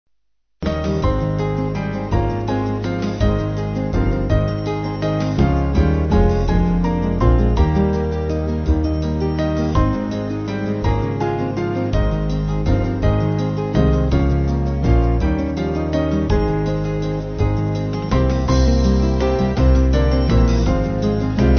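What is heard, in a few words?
Band, Music Backing Files for Churches; Carol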